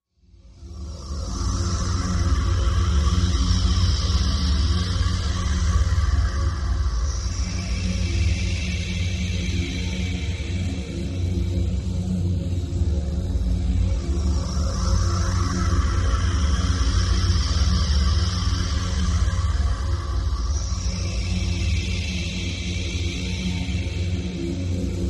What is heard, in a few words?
Breath Deep Slow Deep Sweeping Breathy Drone